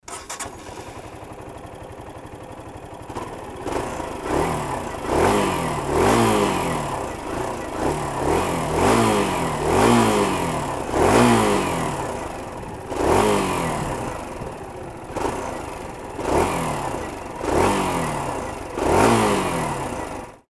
klx125 normal

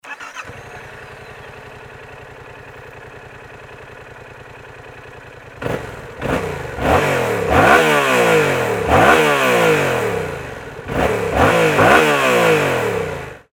mt-07 normal

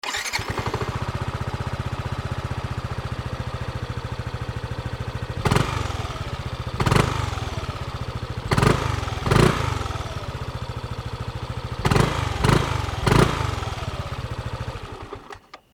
nmax normal

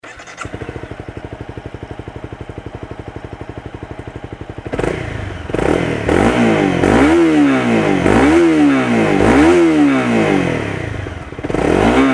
cbr250r

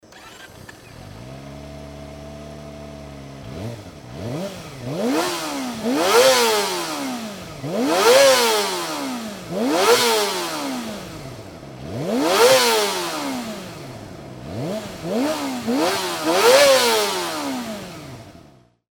zx25r normal; zx250e

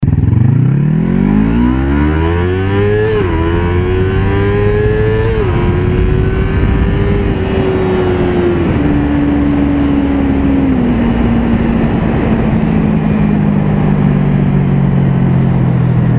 yzf r25 acceleration

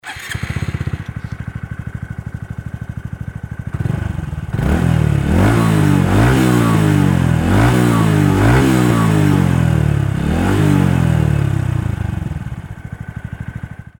grom moriwaki kai

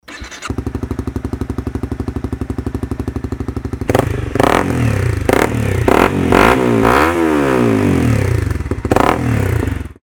cbr250r

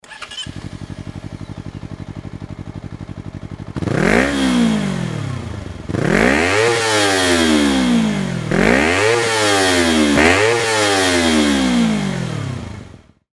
cbr250rr akr bms

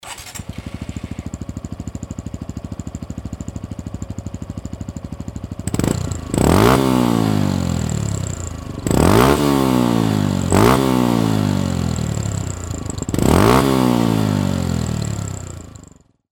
gixxer; bms-r

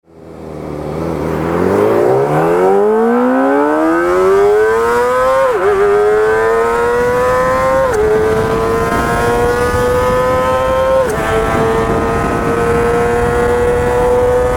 zx25r muffler silent acceleration; zx250e; beams

